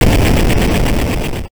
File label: explosion; bigtowertinysquare